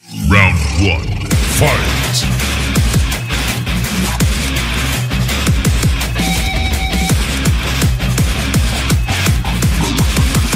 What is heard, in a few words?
intro music; the myst scout